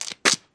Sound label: foot scuff concrete